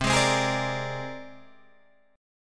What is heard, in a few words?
C 7th Suspended 2nd Sharp 5th C Guitar Drop D